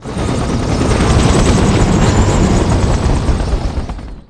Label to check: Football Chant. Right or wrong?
Wrong